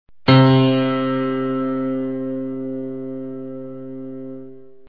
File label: Piano; envelopes attack